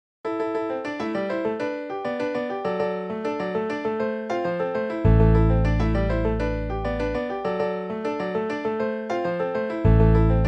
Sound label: Piano Bass